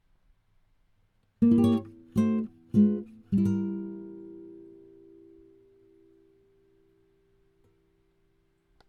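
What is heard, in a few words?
Fail Sound Effect Acoustic Guitar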